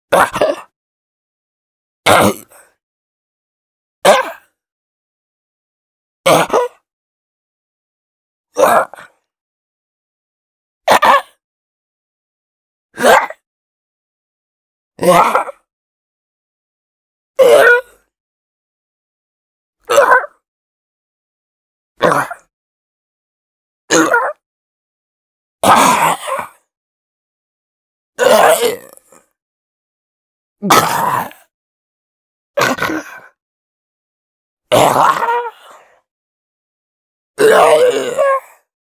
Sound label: ZOMBIE MALE GETTING HIT HURTED SHORT